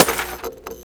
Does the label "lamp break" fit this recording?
Yes